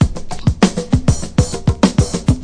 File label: 098 play conga, DRUM LOOPS and BREAKS; 091 to 100 bpm